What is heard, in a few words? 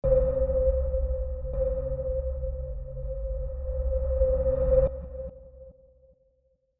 sonar